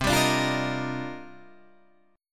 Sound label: C Minor 11th C Guitar Drop D